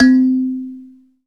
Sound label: SANSA C 4, KALIMBAS, Heart of Africa